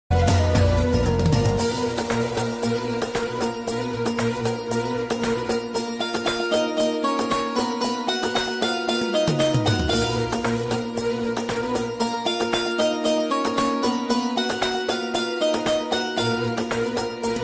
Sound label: Fight Ringtone; Dirilis Ertugrul